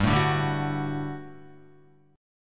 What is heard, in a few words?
G Major 7th Suspended 2nd, Guitar; strummed; 4F,4A,5C,5G,5C,6E